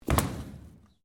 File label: debris; Material components for constructing more complex sounds; Materials